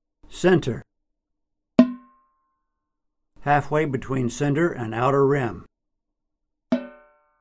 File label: snare drum no snare single hit center and mid edge; TAMA; Stewart Copeland signature snare drum